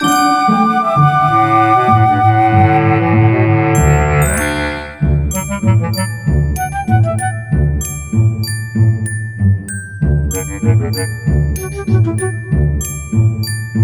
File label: music garden